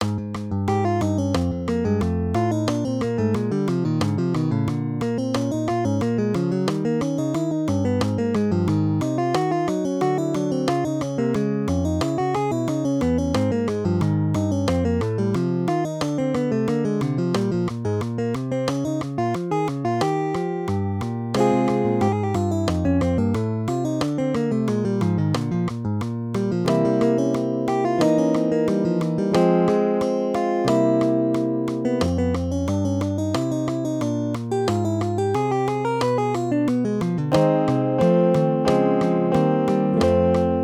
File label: duet, guitar; 4/4